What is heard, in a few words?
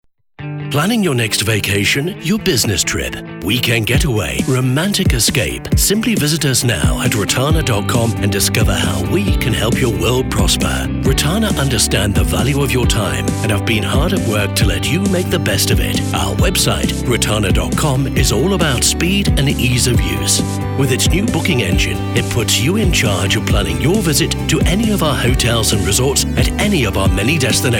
Jingle Sonic Brand; Rotana